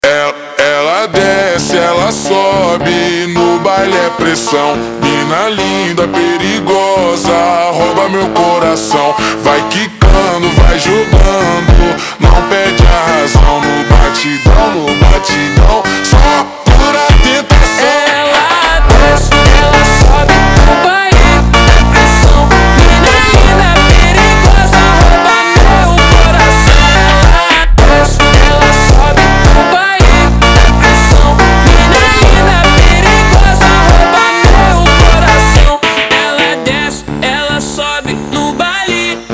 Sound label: Slowed